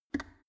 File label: plastic water boiler close lid